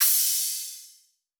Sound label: Crash; Cymatics; Light Free Sample Pack; Drum One Shots